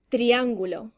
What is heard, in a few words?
triangle